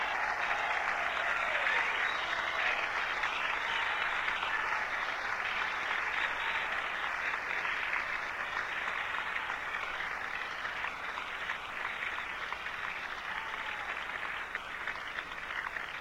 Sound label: Closing Applause Stage Announcement